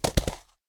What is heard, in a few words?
chariot horse hoof; smite; Apollo